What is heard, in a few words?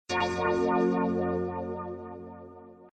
level up